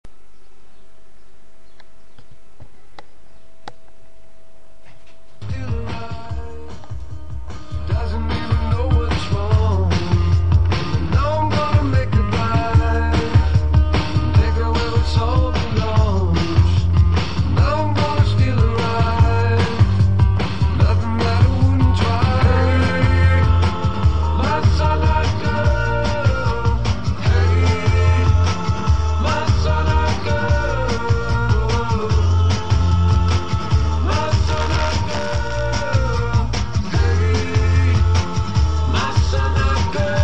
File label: song